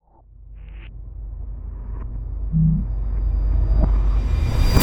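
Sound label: Reverse Bumpy Bang; Cinematic Metal Impacts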